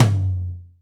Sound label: Low Tom